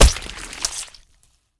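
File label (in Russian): metkii vystrel v zhertvu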